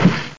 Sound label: Hitt